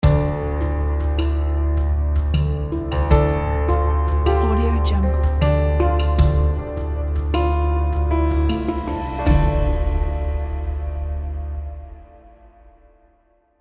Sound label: relaxing yoga logo